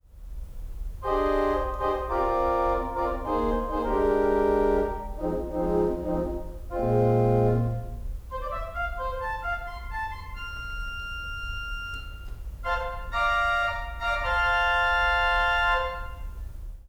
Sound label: diapason